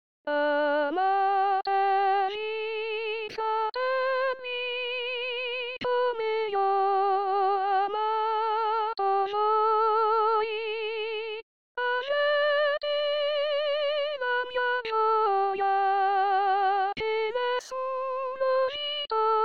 Amatevi Fratelli Coro

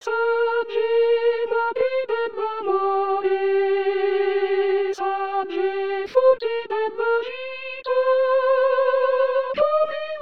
Salve Madre Dell Amore Coro

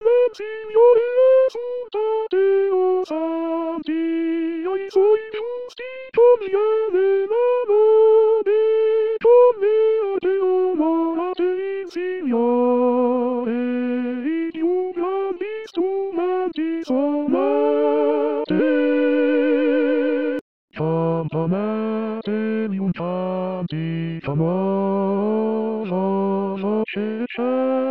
Bassi

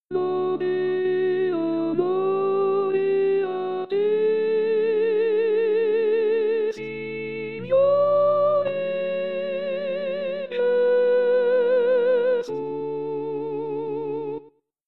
Soprani; Pasqua

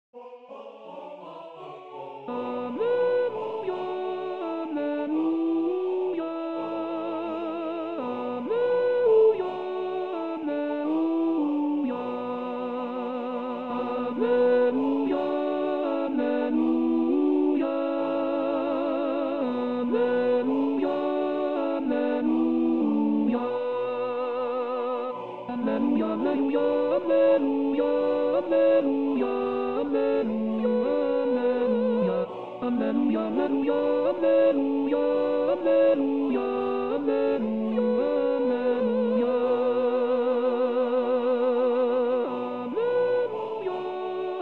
Alleluia Coro; anno liturgico

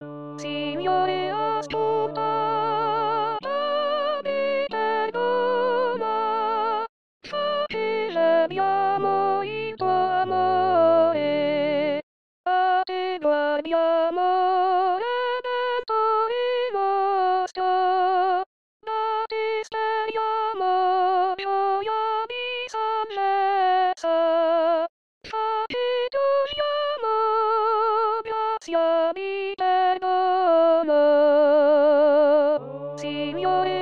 Soprani

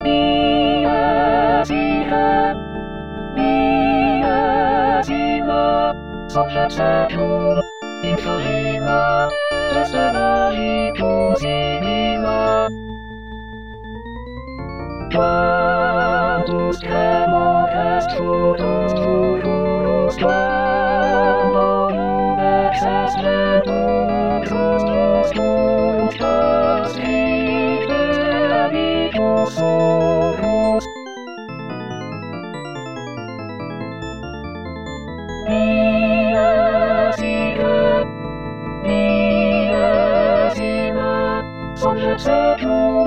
Coro; Dies Irae Mozart

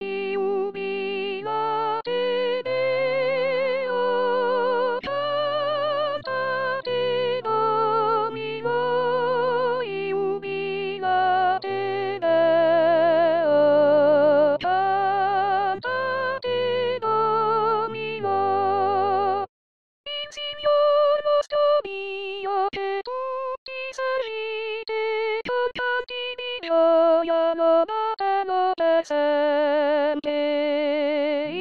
Jubilate Deo Soprani

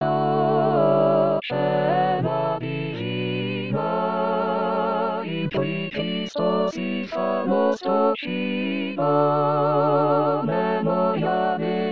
O Cena Divina Coro

